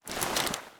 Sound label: beretta m92 slide back; beretta92; garrysmod